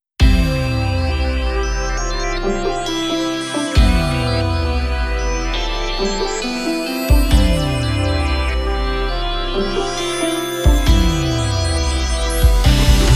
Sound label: toy start